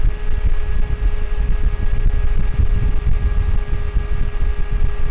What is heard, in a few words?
cpu fan